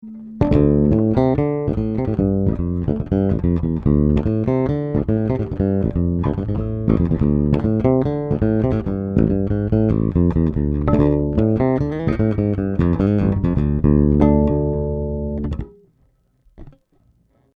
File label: Jbass bridge pu eq; HB Block 800b